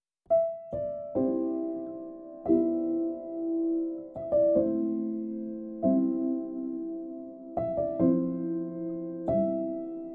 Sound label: Song Piano